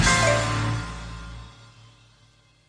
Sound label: end kick splash punch; Punches Hits Discoblasts; single hits